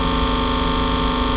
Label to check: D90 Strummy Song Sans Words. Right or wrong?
Wrong